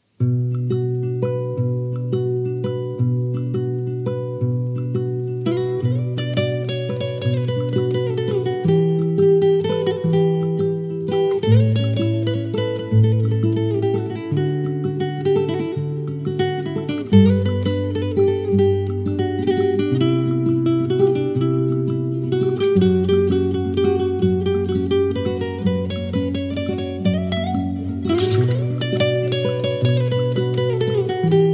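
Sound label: guitar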